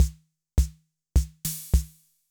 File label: tango, Yamaha MR-10